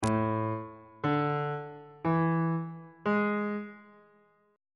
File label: a major 7th suspended 4th chord intervals